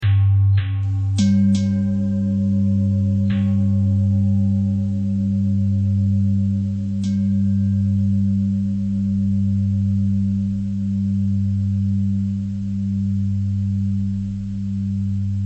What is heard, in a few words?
bell thread bend thread